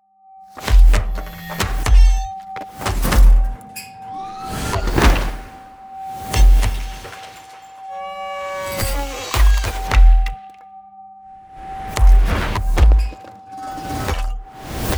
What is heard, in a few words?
Foley Impacts; Concept Samples